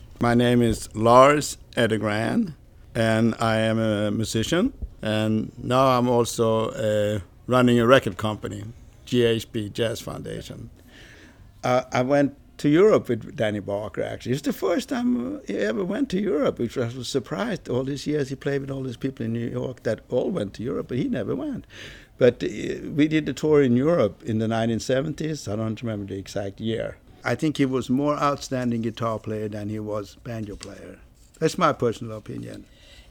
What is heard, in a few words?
GUITAR